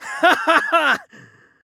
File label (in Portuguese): hahahaa risada; sieghart